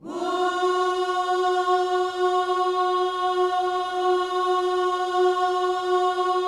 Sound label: WHOO F#4, Vocal Planet Gospel; Spectrasonics; Vocal Planet Vol.1 Gospel; akai